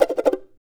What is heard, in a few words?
CHARANGO FX